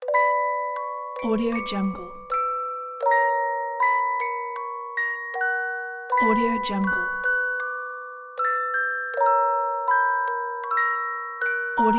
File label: halloween music box logo; frodonut